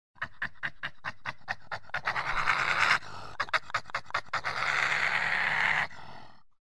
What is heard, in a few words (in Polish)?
borsuk; karty obrazkowe; przygotowania do zimy